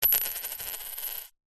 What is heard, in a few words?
money australian 5 cent coin drop on tiled table and spin; zapsplat